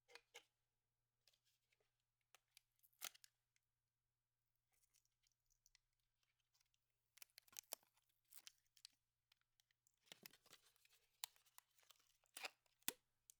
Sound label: Scotch Tape; Soundmined